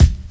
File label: loud kick one shot g key, kickdrum tuned to the G note. Loudest frequency: 424Hz